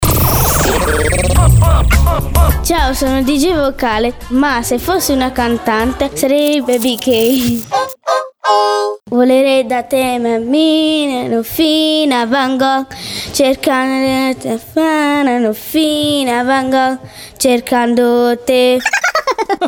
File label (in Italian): VOCALE CANTANTE